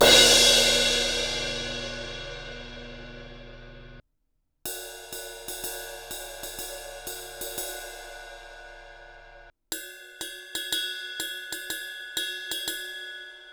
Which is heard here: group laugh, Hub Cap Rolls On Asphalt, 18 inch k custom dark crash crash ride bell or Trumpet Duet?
18 inch k custom dark crash crash ride bell